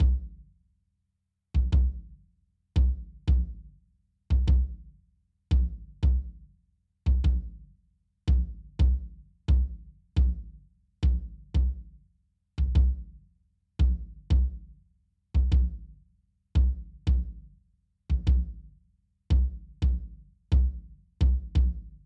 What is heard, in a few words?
Bass Drum With EQ